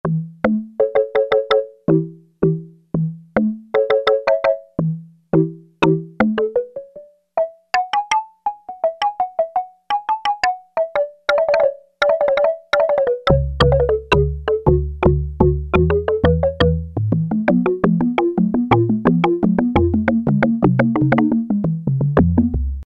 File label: African Mallets, Roland MKS-70